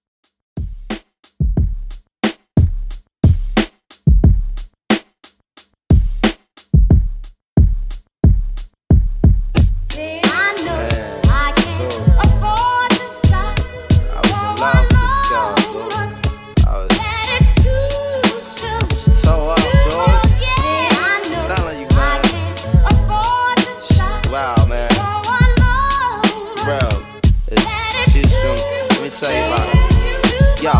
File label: Old School Redrum 90Bpm